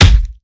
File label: Melee Impact Base; Evolve; Behemoth